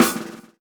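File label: drum roll; E-Lab; X Static Goldmine 2; akai; ROLLS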